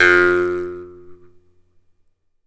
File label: jaw harp, Pitched Percussn, Jaw Harps